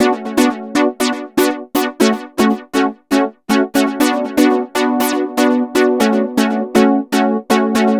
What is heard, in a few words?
Synth